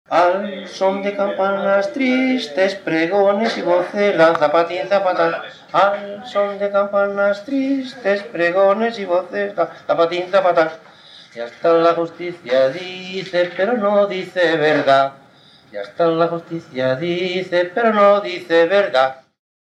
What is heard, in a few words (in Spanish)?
Al Son de Campanas tarareo